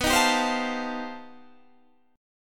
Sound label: C Minor Major 13th B